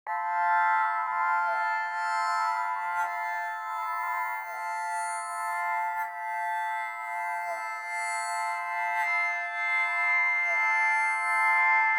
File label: ambient